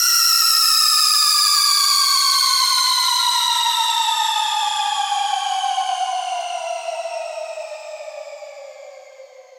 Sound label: noisy faller 100 4 bars; paragon